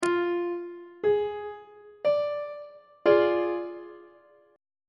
c double sharp diminished 1st inversion on treble clef